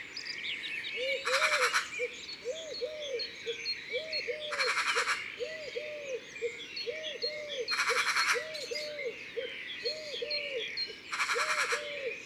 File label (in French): Tourterelle turque; Drome; Concerts Naturels de la Drome; Studio les Trois Becs